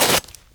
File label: Imp Claws Tear, Imp Claws tear Flesh; Foley